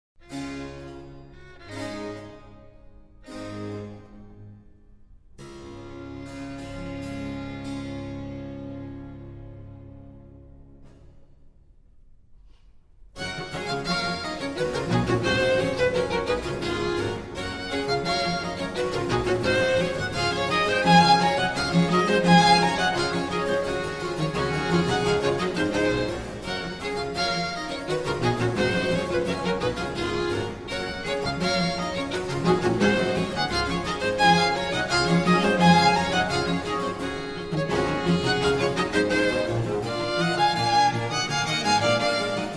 Concerto in G major for strings & bc; Alla Rustica; Antonio Vivaldi

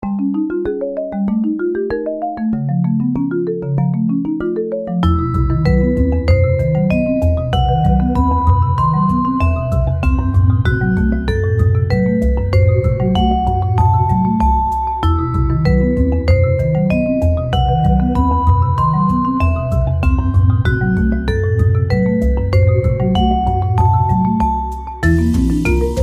Hypnotic Marimba

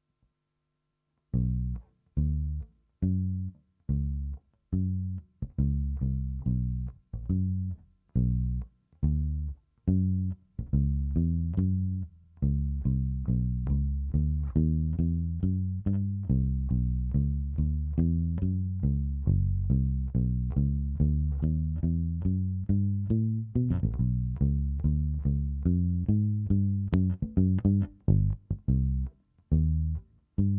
Bass

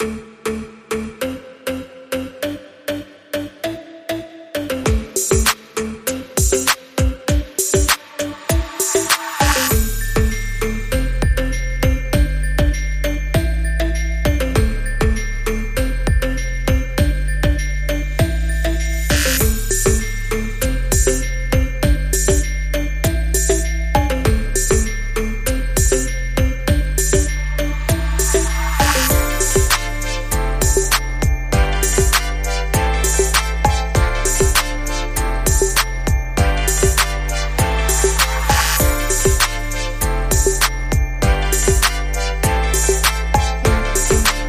Funk

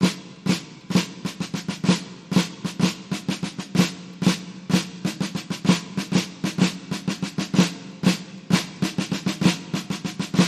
Solo Snare Drum; Battle Hymn Of The Republic

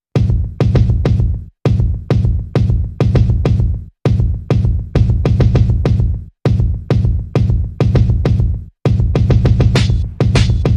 Perc and FX Only

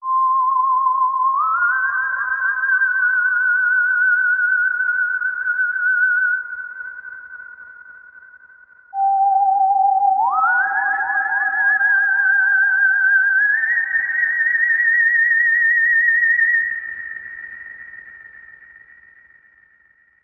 Outer Space Signal

